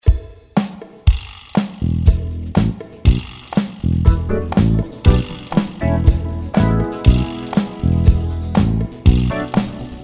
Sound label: Funky Flam